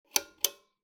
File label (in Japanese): 和風ペンダントライトを点ける音; light